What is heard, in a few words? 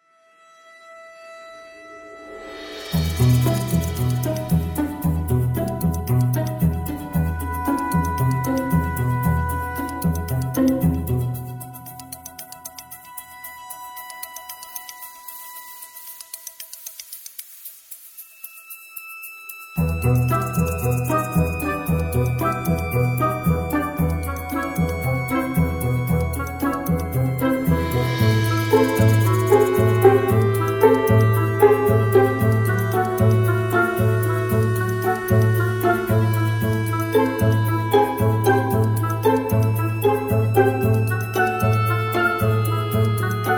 Playing Games more instruments